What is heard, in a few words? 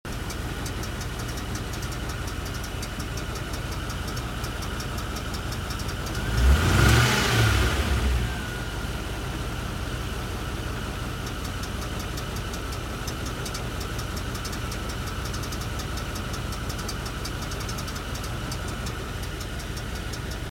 rover engine noise; discovery ii